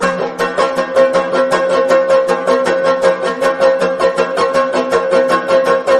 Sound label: banjo; music